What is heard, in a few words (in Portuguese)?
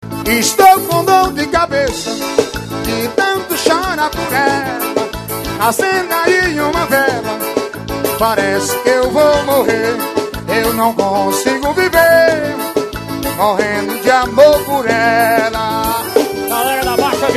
forró